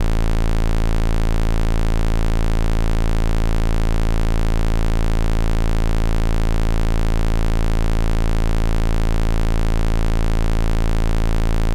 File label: Mixup output SQUARE; modular; ac-coupling